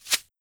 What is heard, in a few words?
Scatter Shot Arrow; Battle; Ranger